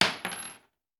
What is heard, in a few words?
Bullet Shell; Sound Ideas; Guns